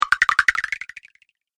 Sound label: Magic Tokens Game Fx; Video Game Sound Effects